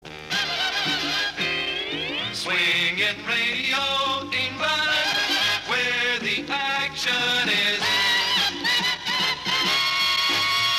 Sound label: jingle - where the action is